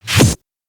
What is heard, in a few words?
Snare, PsyTrance